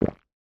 human swallowing loud

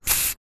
household aerosol can spray short; zapsplat

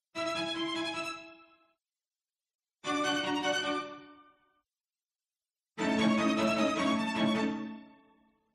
ORCHESTRA Jingle Violin Mythical Fast; MUSICAL; smartsound

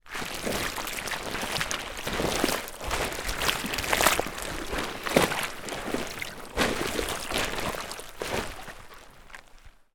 foley swimming pool plastic cover movement

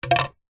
foley stick small set down on concrete ground; zapsplat

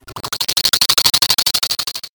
sound design mid range stutter; zapsplat

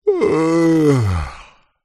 human male middle aged yawn restful happy; zapsplat

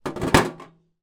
foley small metal case tin down on concrete; zapsplat

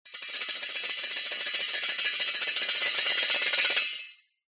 musical instrument ching gamelan shake crescendo

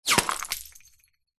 bullet whizz hit glass bottle smash

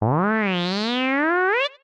synth bebot sawtooth robot vocals circle rise; Jessey Drake

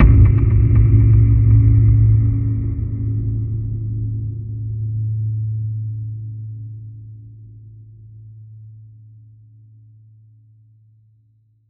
Impact Boom Flutter; bottlerocket

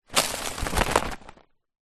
bird flap wings in tree leaves fly away; animals

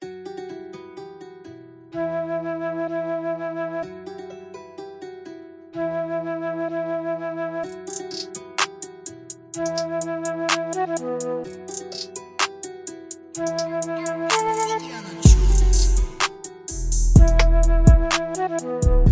music 126bpm emin

